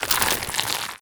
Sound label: zombie move, foley; Half Life Model Pack